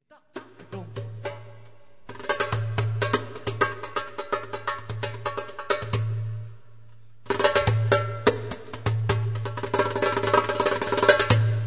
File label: dumbek live